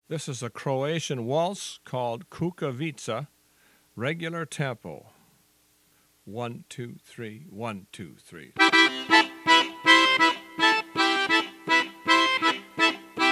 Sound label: Kukavica Waltz